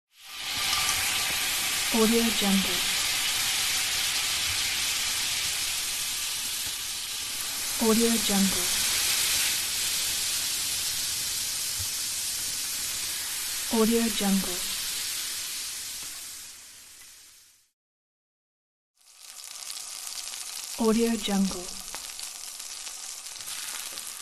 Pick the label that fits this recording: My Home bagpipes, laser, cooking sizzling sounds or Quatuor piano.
cooking sizzling sounds